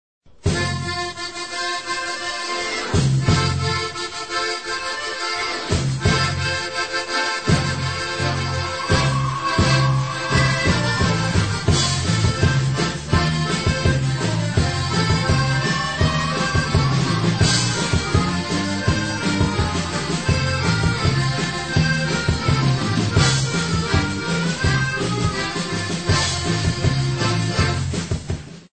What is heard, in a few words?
Rock n Roll medley